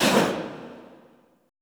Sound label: ALL COUGH, Classical Choir; Best Service; Extended Classical Choir